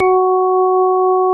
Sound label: ORGAN; Best Service; Dance Mega Synth; 90 s Sample CDs